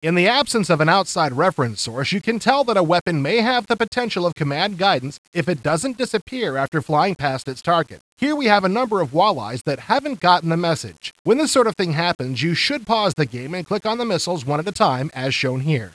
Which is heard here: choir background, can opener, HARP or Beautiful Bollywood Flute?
HARP